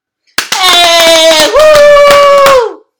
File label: applause organic